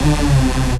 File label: RAVE, RAVESYN